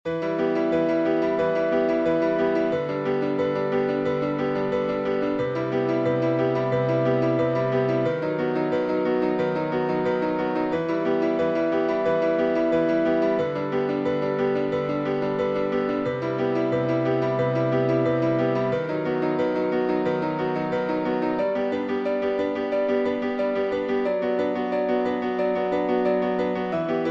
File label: Birds Piano